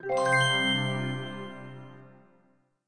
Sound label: Shrine Birth